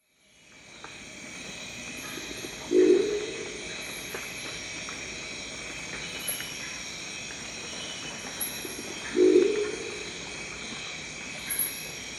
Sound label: Crested owl; جغد کاکلی